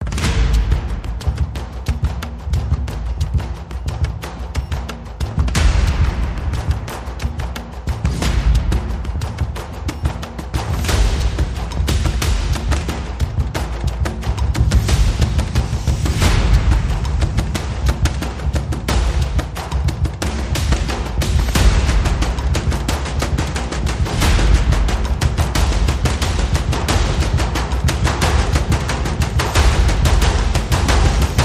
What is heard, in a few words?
Trailer, Percussion and Effects